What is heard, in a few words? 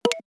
camera start, Nokia 808 PureView